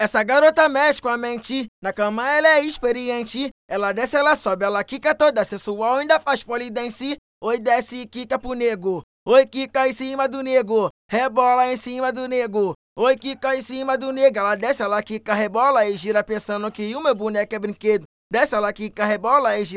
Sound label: acapella